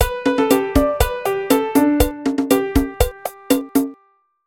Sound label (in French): CARILLON